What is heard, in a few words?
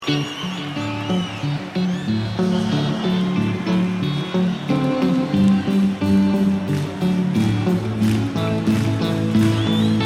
Jingle Bells; The O2 Arena London